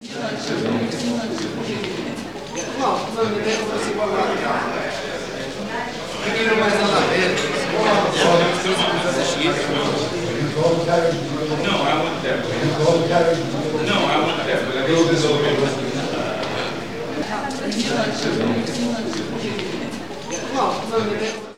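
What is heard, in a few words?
human group talking, interior; best service Blue Box; crowds interior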